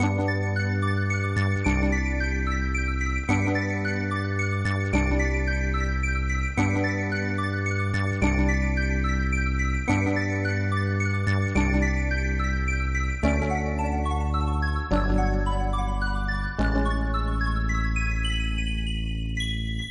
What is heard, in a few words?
theme loop slow dream drift